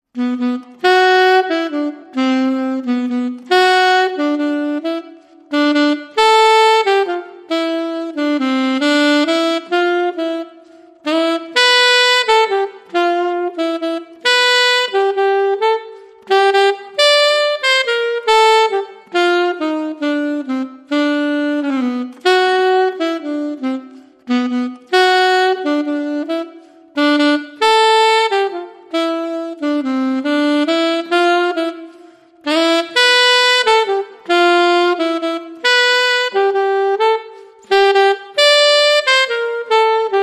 Jazzy Waltz, Saxophone Only

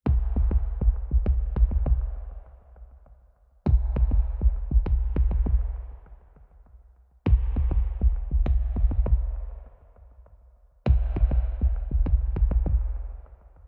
Pulses Only

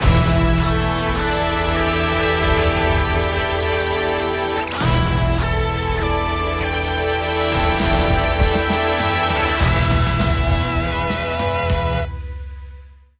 Hard Sympho Epic

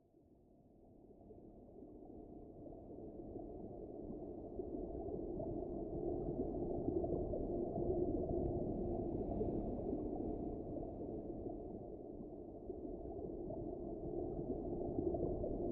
Ocean Surface Light Ocean Sounds

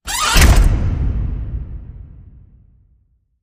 Creaking Door Slam

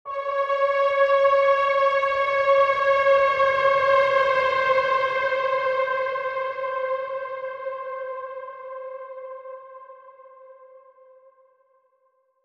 Muffled Bend

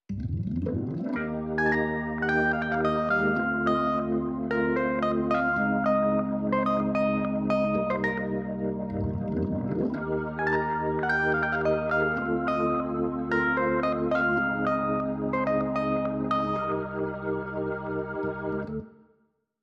Keys

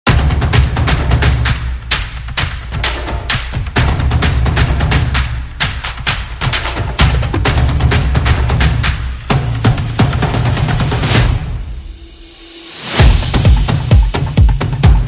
Percussion and Effects

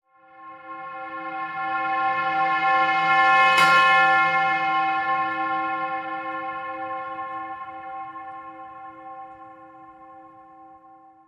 Deep Bell Reverse

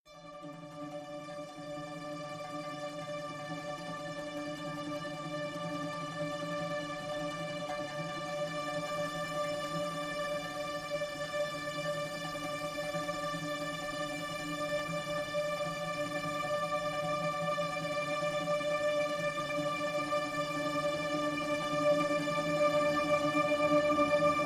Suspended Trems (D)

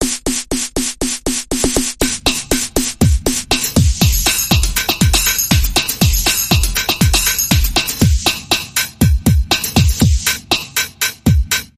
Bopper Club Dance Bumper

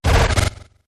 Short Turbulent Stutter

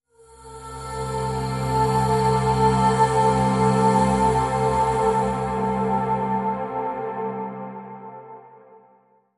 Drone, Link Sting

